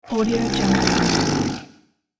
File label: that beast can snore